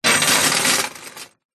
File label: keys large bunch prison guard set down on metal surface hollow